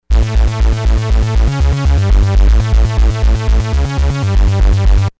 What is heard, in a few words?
bassy, synths